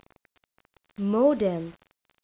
modem

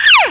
Streak; Working Model Program

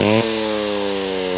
Chainsaw; Working Model Program

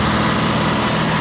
Engine; Working Model Program

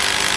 Drill; Working Model Program

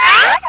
Cartoon Streak; Working Model Program

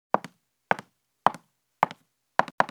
female footsteps; DIGITAL SIGNAL PROCESSORS

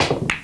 Billiard Break; Working Model Program

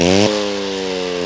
Motor Chainsaw; Working Model Program

